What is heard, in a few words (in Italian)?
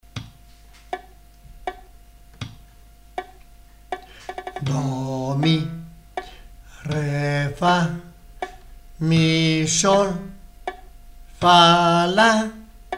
Solfeggio cantato